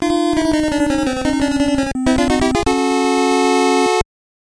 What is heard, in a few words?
Game Over; Double Dragon